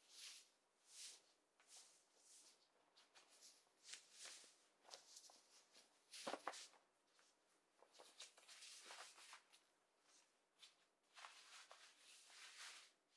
シャツ を 着る

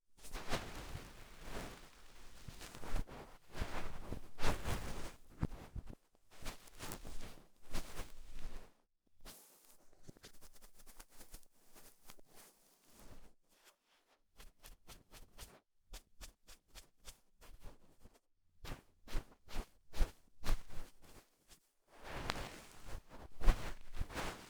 梵天, 耳かきに関する音; 3DIO

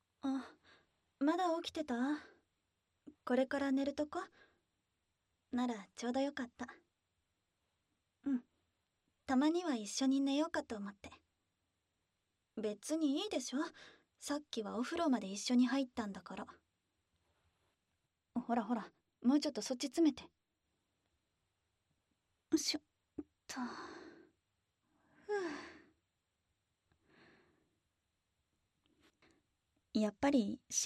キス・耳舐め, ASMR; 素股; 効果音なし

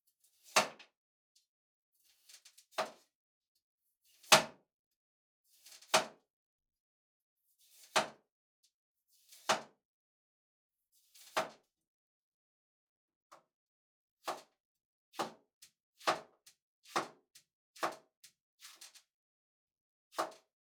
切る にんじん, 食事に関する音; 3DIO

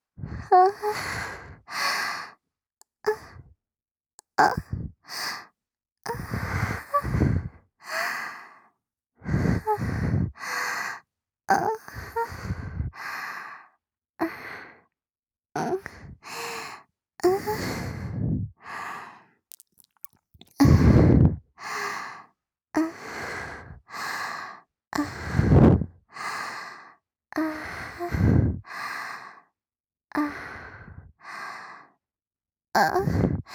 あん…あん…, ASMR

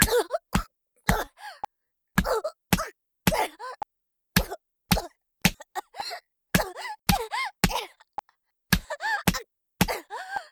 ストレート メト (威力高め) BPM110 ワンツースリー, メトロノームあり

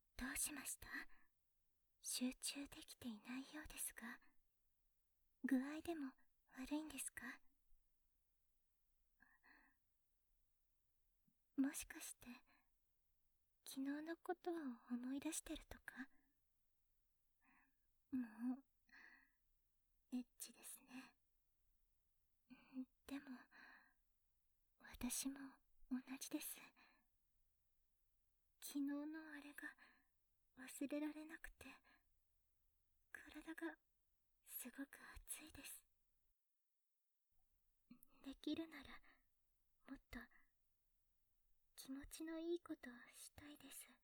キス・耳舐め・素股, ASMR; ミサを抜け出して; 効果音なし

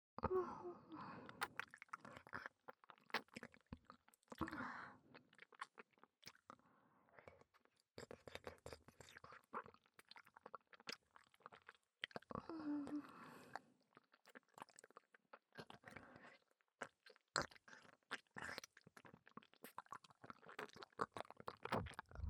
耳＋首筋舐め

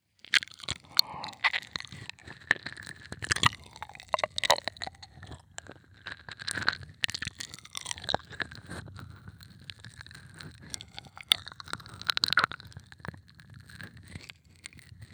片耳ひたすらゴッドタン！【極・耳舐め】, ASMR

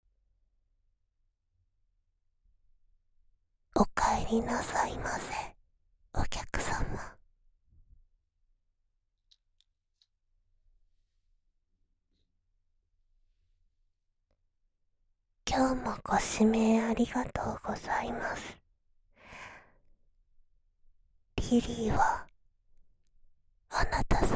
耳舐め屋さん, ASMR; 耳舐め屋さん-リリィ

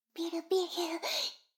シコシコ音声; 逆時間停止されて

